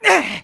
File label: Throw Heavy; Link throwing a heavy object